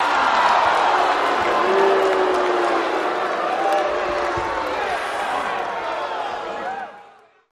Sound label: Crowd Yells Aah Then Boos